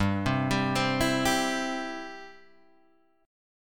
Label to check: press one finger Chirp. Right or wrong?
Wrong